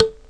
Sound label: bump, pinball; professor